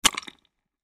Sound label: Impact Mud Splash Hole Hit Moist Surface; Low Impacts; Blastwave